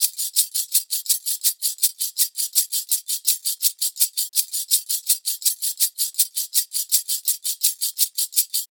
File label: tambourine loop, 110; perc construction loops; SEISMIC Drum Sample Pack; Slate